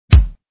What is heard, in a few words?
bassdrum